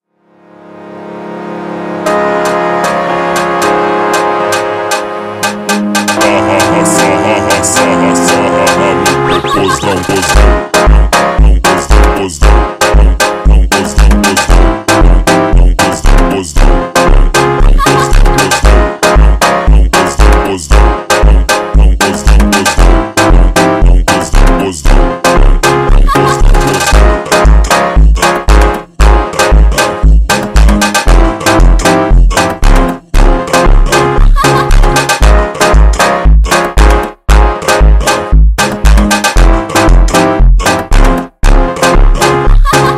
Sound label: Funk slowed